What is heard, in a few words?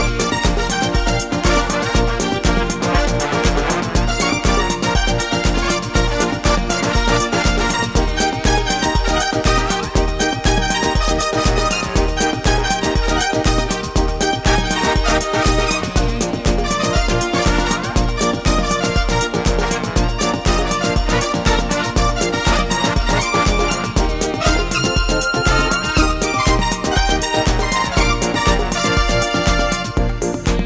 Samba